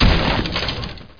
wood; TNP demo

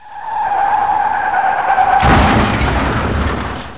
Sound Skid 'n Crash!; The Amazing Spectacular Ordinary Bottle

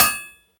hammer; kult

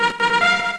Round end sound; Trinity 1.1

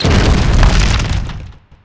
rock

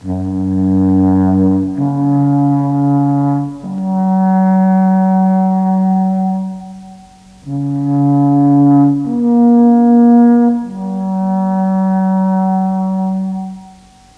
ALPHORN

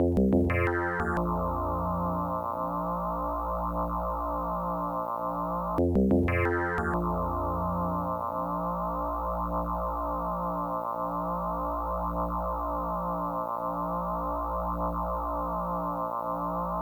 win; tri x

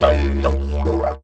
didj toot, Musical Instruments

